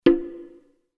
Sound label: UI Quirky